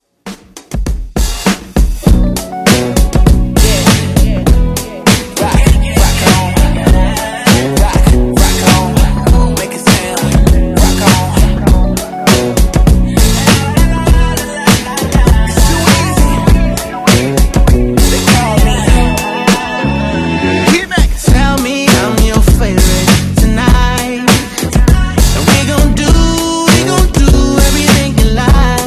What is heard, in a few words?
Top40 Redrum